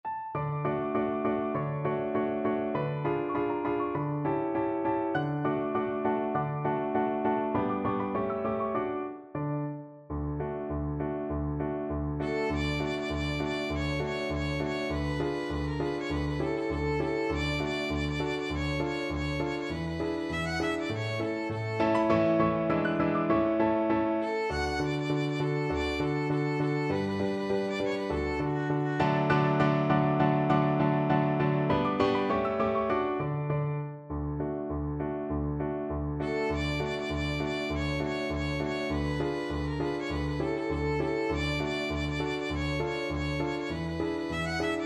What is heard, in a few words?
when i was a lad violin